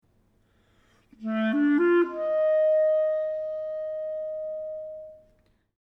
original melody fast

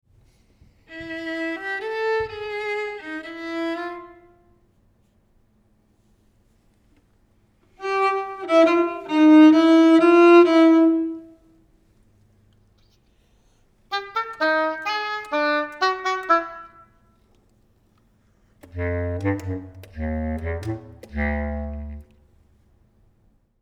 Ligeti melodies in turn

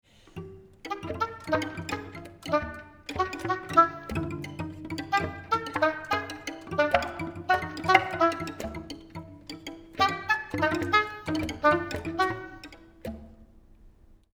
Ligeti melodies wood or brittle

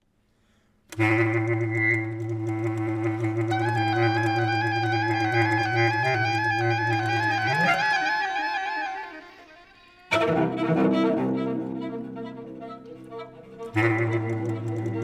Spinning together

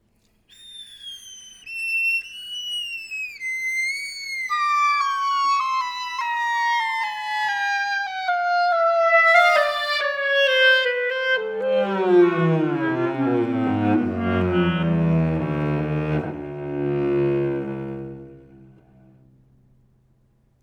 Sinking

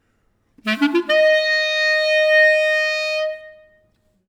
melody fast accented

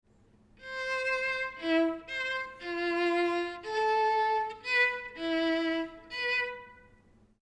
melody

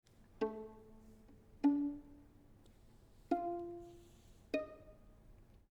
melody pizz

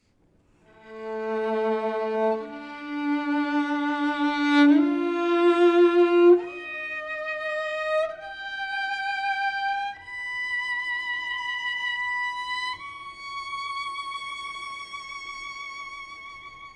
melody extended

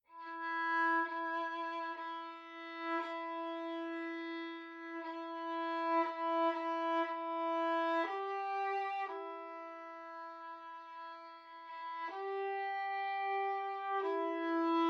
one to four notes and back

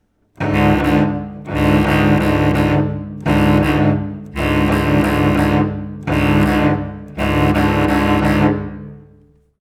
Grinding unison

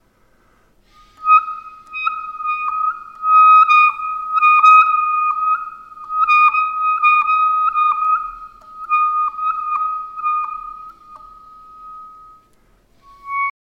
Cirrus Light Alternate Fingerings

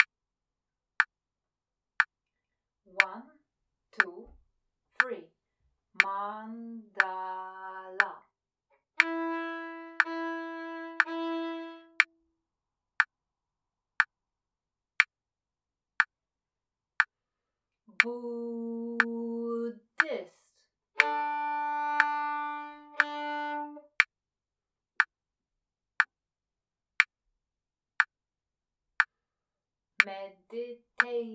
34 rhythms

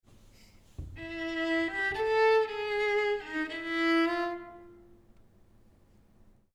Ligeti melody

